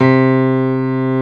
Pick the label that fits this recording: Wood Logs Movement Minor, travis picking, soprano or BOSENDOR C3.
BOSENDOR C3